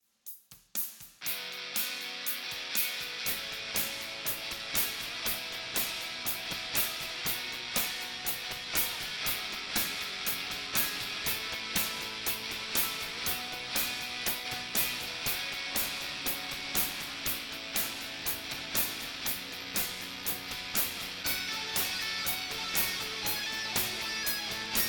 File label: ROCK RIFF Residual Noise